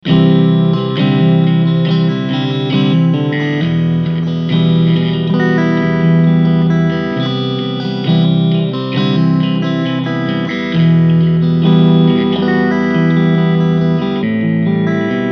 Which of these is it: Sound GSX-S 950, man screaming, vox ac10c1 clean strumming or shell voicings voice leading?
vox ac10c1 clean strumming